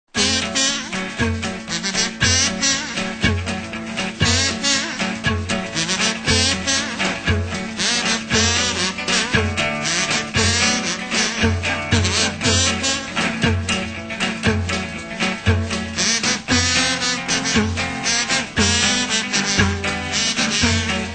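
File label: Music Ambient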